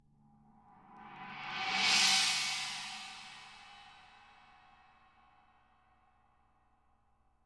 CHN SWEL, CHINA SWELL

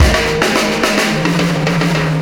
System Overload Beat, Processed Drumkits

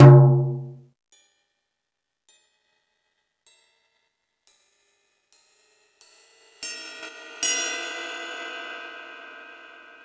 Jazz Drums, E-MU Pro-Cussion; drum machine

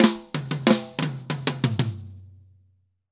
Drum Break 120; Drums & Percussion; Festa Latina; Best Service; Festa Latina VOL-1

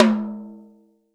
TOM, Real Drum Kit, Rock Kit

